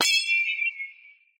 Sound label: metal bat